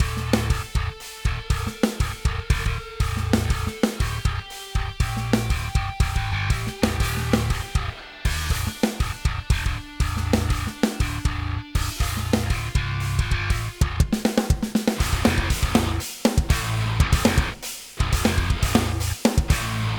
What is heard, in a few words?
track with reamp; drum re-amping